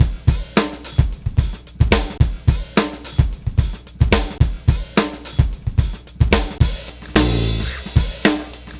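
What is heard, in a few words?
drum 109, 109bpm, Breakbeat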